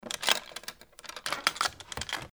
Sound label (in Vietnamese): Tieng khoa cua